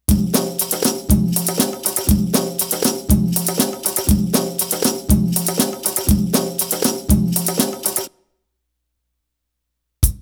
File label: Arabic Rhythms